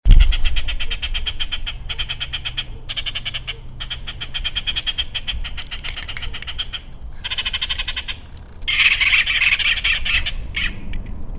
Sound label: yucatan jay, birdsongs, belize